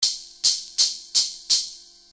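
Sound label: open hi-hat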